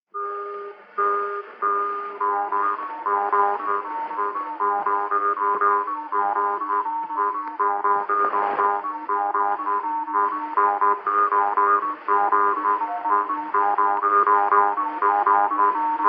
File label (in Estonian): labajalg, parmupill, folk; Kirbla; Kuuse